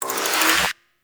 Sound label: Harpoon Gun Charge; Warframe; Sharkwing; Grineer